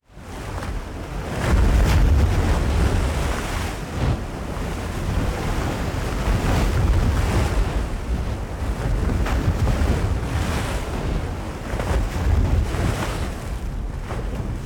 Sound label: wave bed; smite; god cthulhu